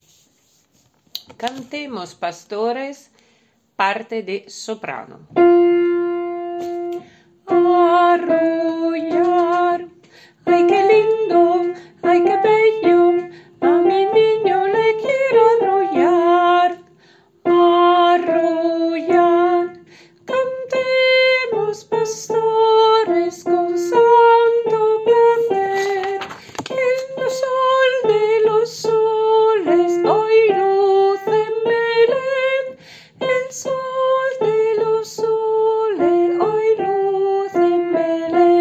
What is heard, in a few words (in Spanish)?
Soprano, villancicos